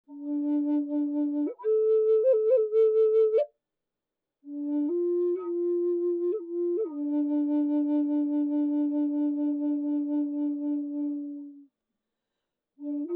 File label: Bass D Eastern Cedar, flutes